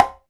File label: conga slap; Back In Time Records - Big Bang